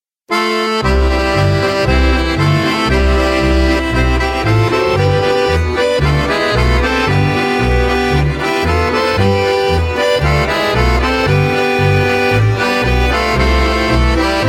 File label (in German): bass spass